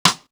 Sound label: Snare, Drum One Shots; AMG - Coldcut's Kleptomania VOL-1